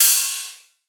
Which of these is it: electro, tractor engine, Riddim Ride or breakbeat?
Riddim Ride